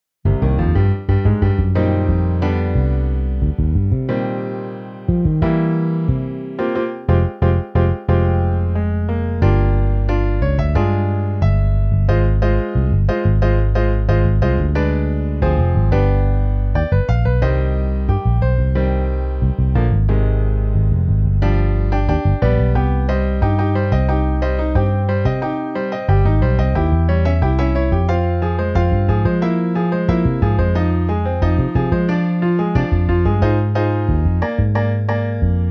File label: Piano Bass